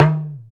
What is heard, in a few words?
PER Percussion